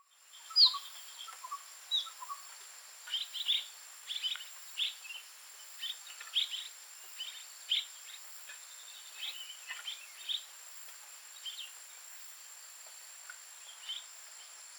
Striated Swallow Cecropis striolata stanfordi, striated swallow call